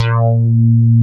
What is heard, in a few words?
JX BASS